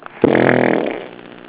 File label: tuba